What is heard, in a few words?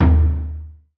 taiko